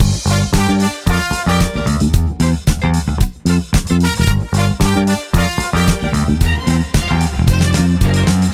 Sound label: emote disco; fortnite; athena